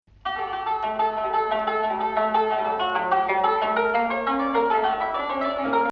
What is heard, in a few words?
Hijaz Octave